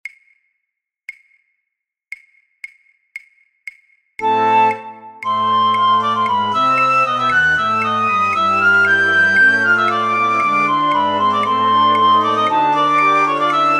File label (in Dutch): fluitorkest, Piccolo B; voor oefentracks; Matthaus Passion